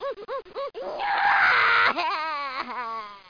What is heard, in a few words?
baby cry; 3dcyber